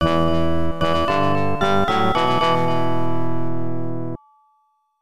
Game Over Music; The Return of Ishtar; PC-8801

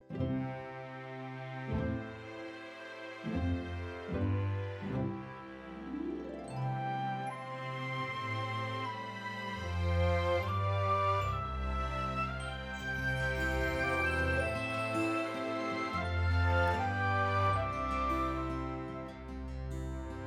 Snow Theme Bgm; My Little Pony Magic Princess

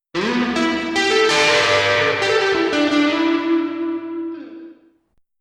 Game Over; Yakuza 3

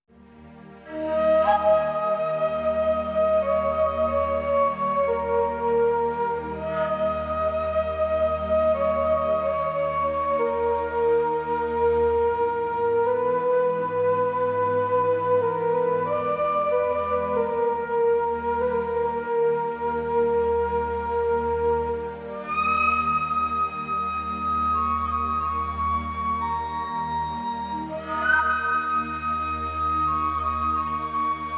Bedouin - Desert; anno 1503 gamerip

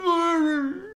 argh

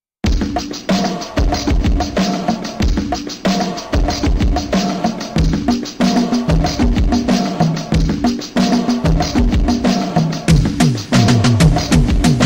Insert Disk Beat; humans the amiga

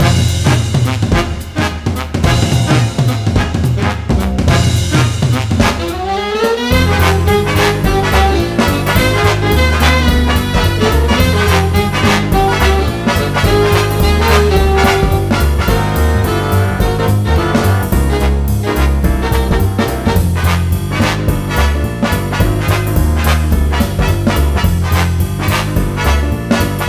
music Droid Dance

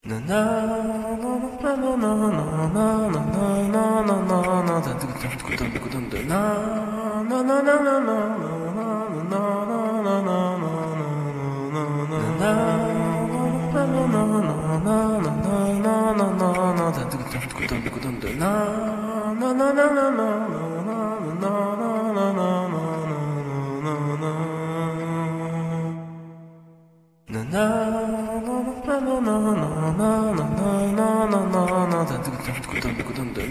Load Loop; korogashi puzzle katamari damacy dsiware 2009 nds gamerip

